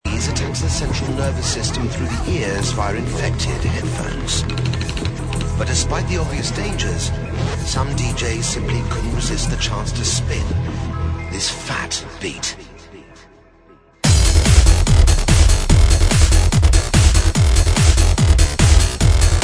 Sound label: phatt beat hardcore